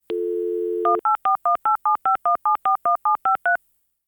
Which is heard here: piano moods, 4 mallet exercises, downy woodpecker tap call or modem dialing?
modem dialing